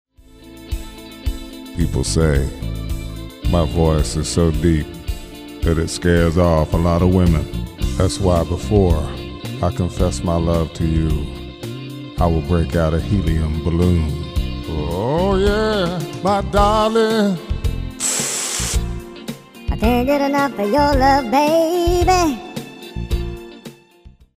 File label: Sings